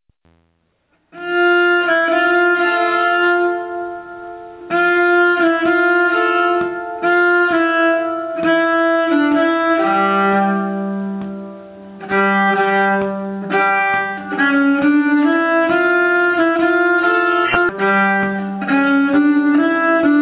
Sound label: Lent, nyckelharpa; Porte Monnaie Lent